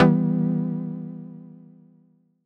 Em Wibbler, Chords; Electronisounds

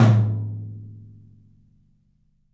TOM, Studio Toms; 3D Percussives

